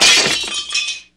Glass Break; flstudio502

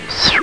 launch; 300 games for mac; Arcade; Copter 1.1; Launch 2

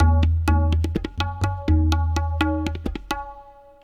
PERC LOOP ETHNO 125; eJay

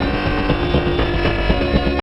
Indus; Revista CD Expert 50; PCGamer50

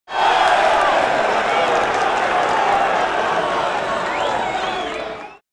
little cheer

